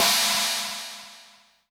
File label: China, crash